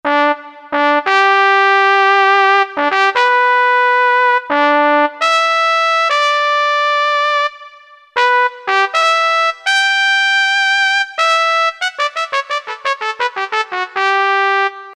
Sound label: Soft Trumpet, Roland U-220; Factory Patches